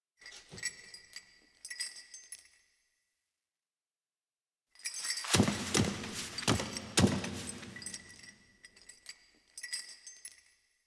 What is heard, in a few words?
Movement D; The New War; Ballas; Cinematics